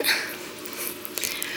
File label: Female Vocal